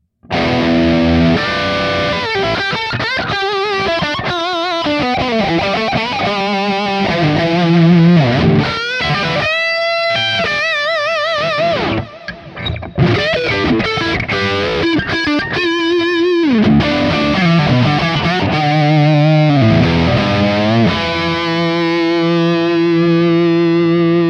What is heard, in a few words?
Hagstrom Ultra Max Highgain Lead Bridge Neck